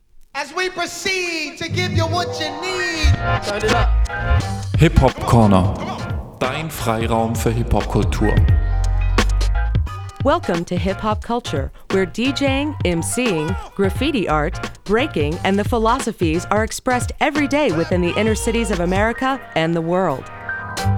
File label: hip-hop-corner jingle